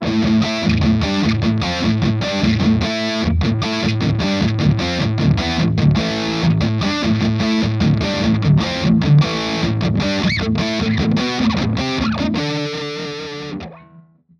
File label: rock open; laroqua 212 studio test